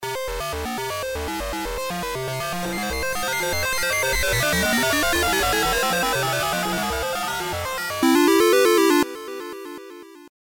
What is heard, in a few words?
ring modulation test